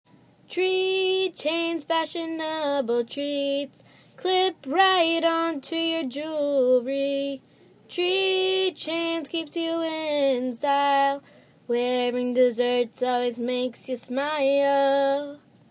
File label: jingle